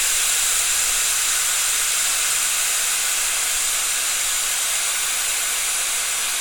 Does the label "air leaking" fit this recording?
Yes